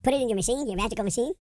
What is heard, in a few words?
E TALK, Vocal Planet R&B Dance; Spectrasonics